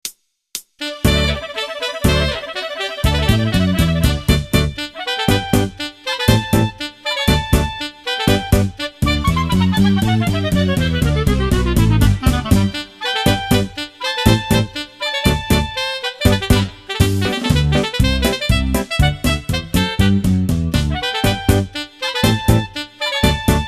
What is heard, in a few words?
Ballo Liscio Sax Clarinet; Sax + Clarinetto – Polka e Valzer; Medley